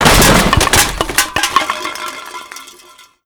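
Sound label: destroy trash debris; Call of Duty Modern Warfare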